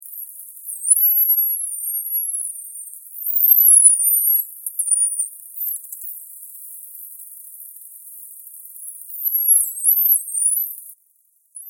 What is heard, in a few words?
Dolphins